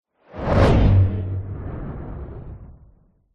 fantasy magic spell whoosh ball of energy pass by fast